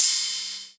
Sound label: Ride, Cymbals